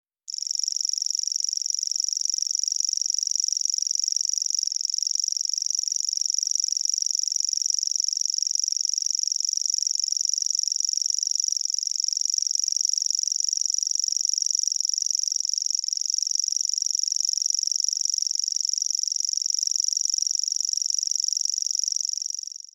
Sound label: Locustella naevia